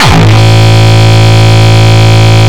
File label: Longkick, kicks